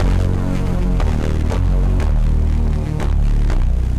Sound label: Darkness Bass, Synth; Ableton; Factory Packs; The Forge by Hecq